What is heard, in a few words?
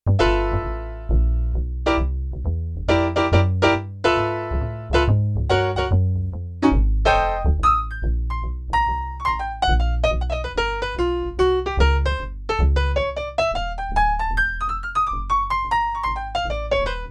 Piano Bass Split; Yamaha TX16W; Jazz Performance